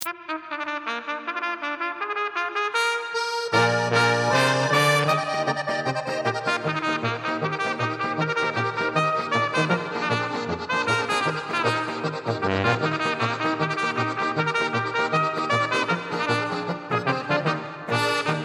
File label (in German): Lustige Trompete